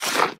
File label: Shovel Success